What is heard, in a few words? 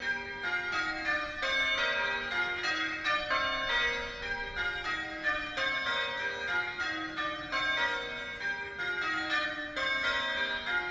Pealing church bells